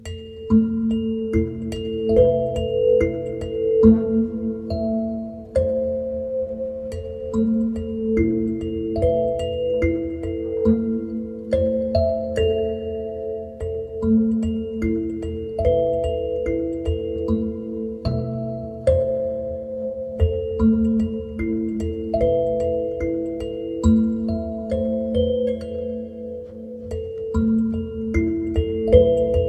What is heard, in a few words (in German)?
Sansula